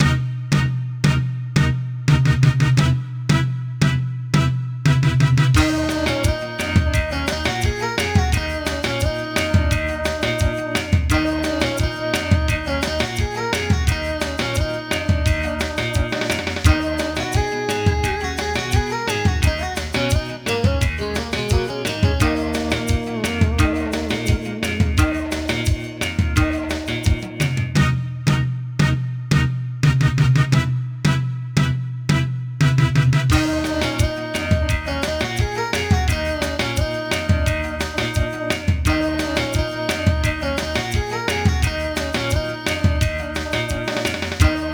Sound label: Impact Boss Confrontation; Ganbare Goemon Uchuu Kaizoku Akogingu